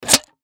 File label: Tin Box Remove Lid Open; Lids; Foley; Objects; Blastwave